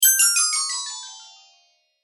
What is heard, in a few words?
Fallen Magic